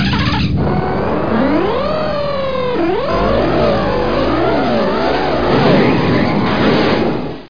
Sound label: CYCLES; Millennium Gold 2000